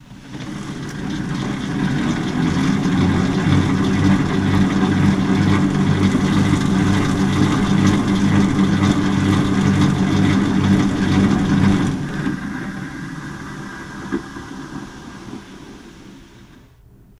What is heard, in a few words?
Bike Exercise Riding Stationary Close Up Dron